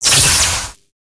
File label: Shock console; foley